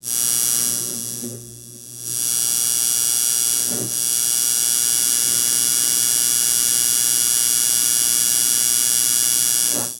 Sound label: electricity sound short